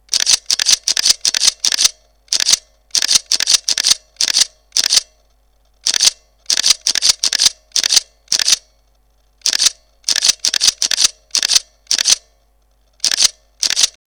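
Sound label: Pentax K200D continuous high; JPEG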